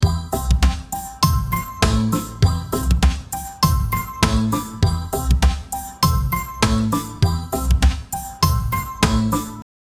short music cue for the, corporate

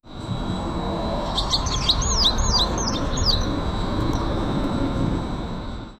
the sound of a heavily, birdsong

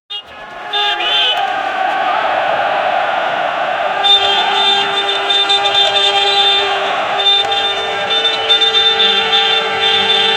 fan horn effect in a, football

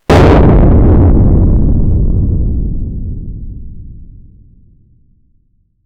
atomic bomb; nuclear

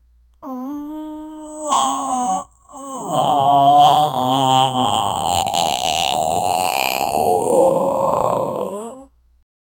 aaahh, pain

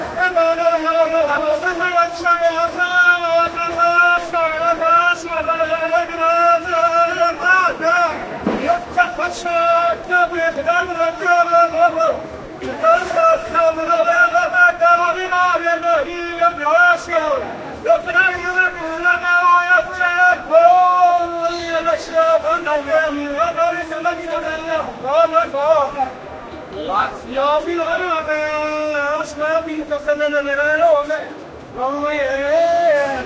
arabic market people

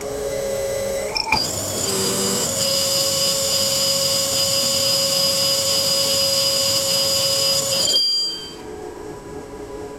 sound of an electric cent, laboratory

